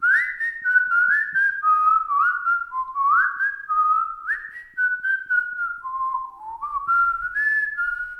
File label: Whistling